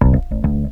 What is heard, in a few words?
BAS RIF; E-Lab; X Static Goldmine 2; akai